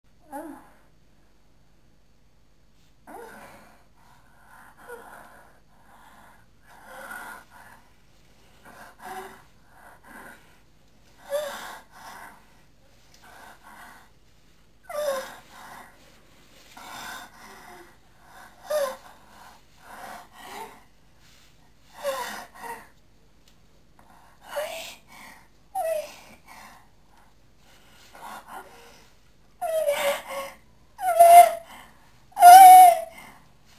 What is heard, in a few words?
masturbation